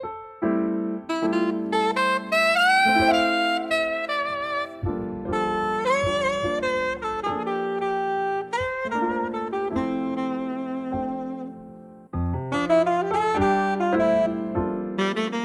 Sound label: Fortepiano Pianissimo